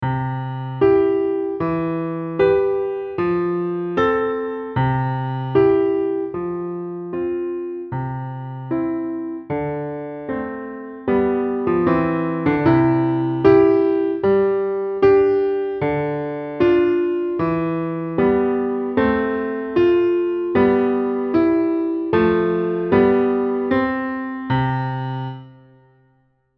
Accompaniment; ViolinSchool

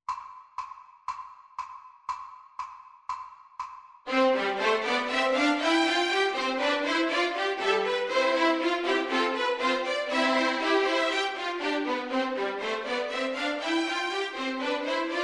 Backing Track; Schloming; Study No. 1 Op. 14; ViolinSchool

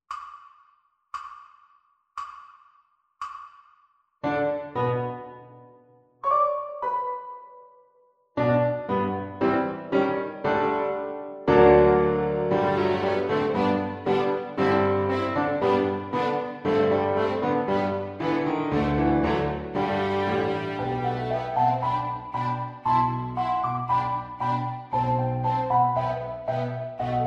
Backing Track Violin Piano; ViolinSchool

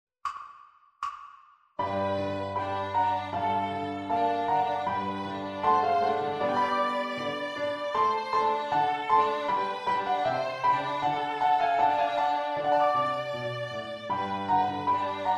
Violin Piano; ViolinSchool

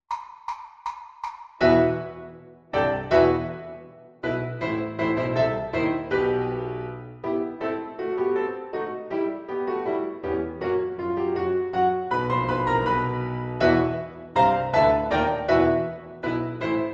Piano only; ViolinSchool

